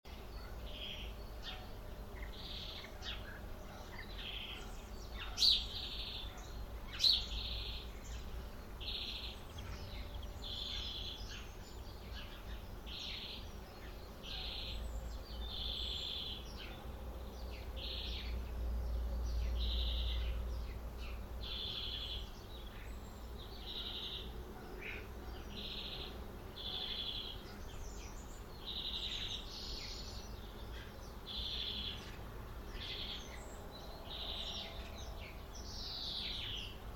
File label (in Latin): Chloris chloris; SETE